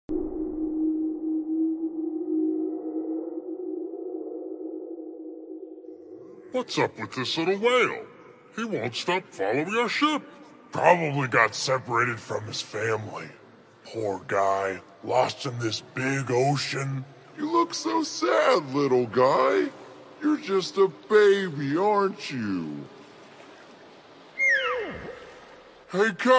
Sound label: Casio